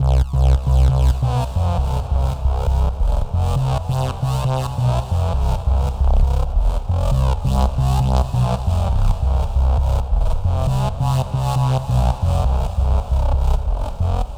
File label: wubs